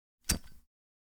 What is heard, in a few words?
Lighter Spark